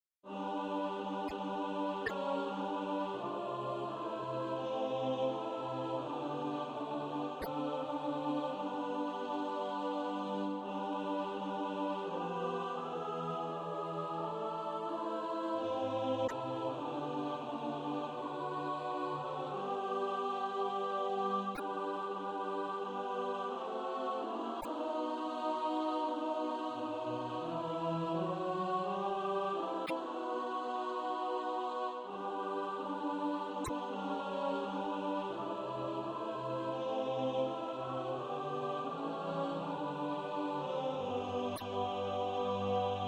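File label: alto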